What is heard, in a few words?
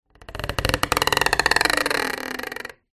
industrial electrical tape strip long pull stretch creak